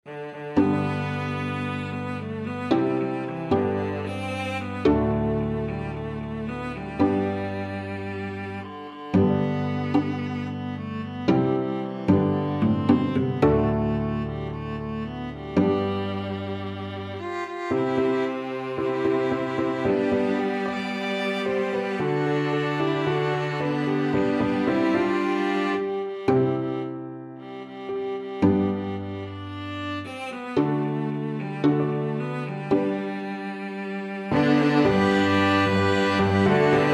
siyahamba, piano quintet

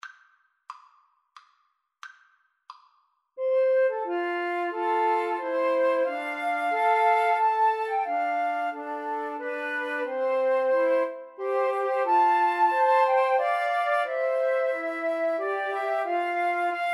Flute Trio

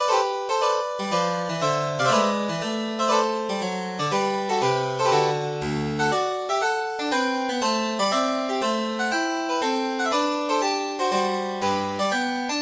Partita, piano

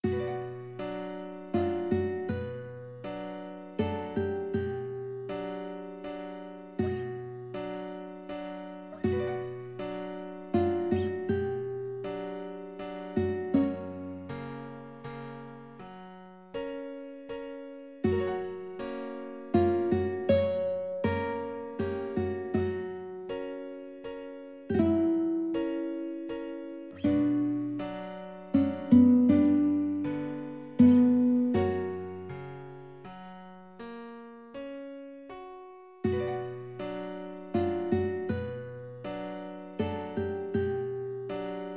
mandolin

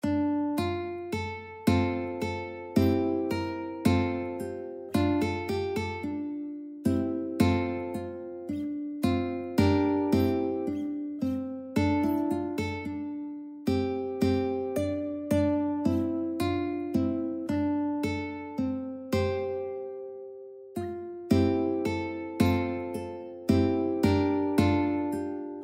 ukulele

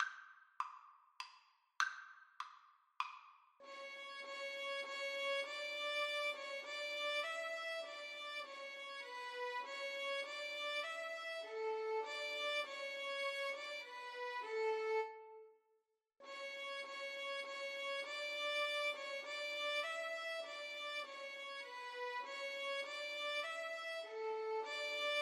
violin duet

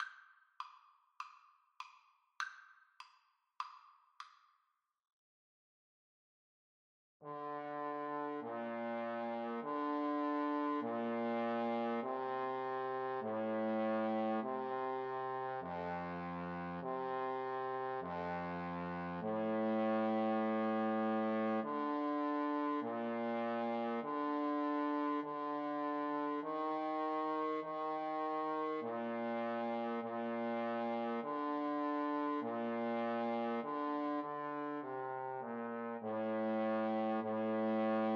o sole mio, Trombone Duet

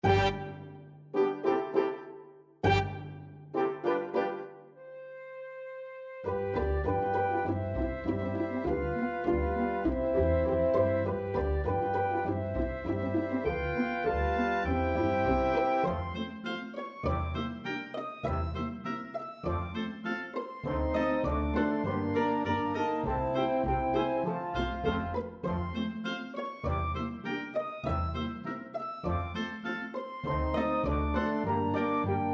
pizzicato polka, orchestra

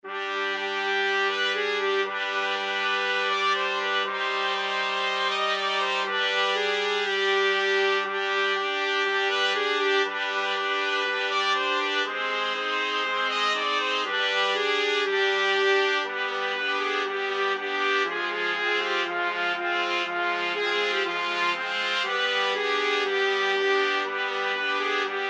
hava nagila 4 trumpet

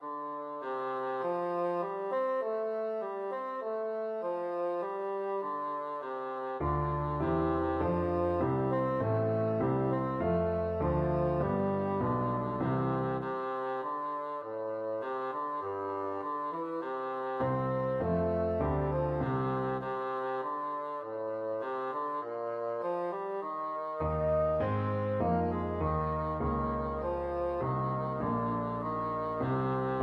bassoon

